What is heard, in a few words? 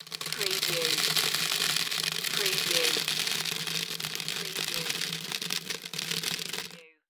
Popcorn Kernels Pour; sound-effects-bible